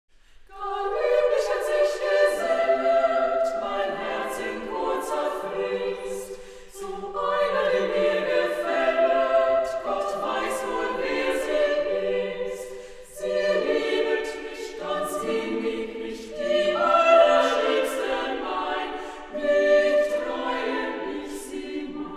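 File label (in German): Volkslieder für 3 4 stimmigen Frauenchor Liebeslied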